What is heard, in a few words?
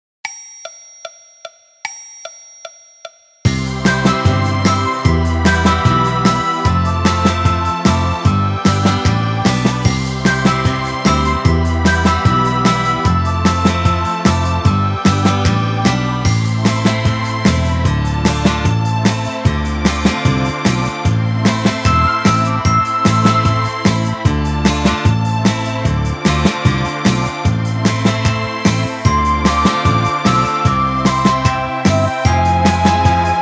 Orchestra Version